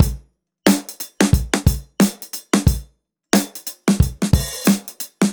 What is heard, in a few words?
Swing Dry; Quest 90